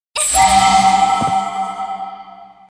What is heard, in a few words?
change Hero